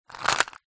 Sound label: nature ice crunch; zapsplat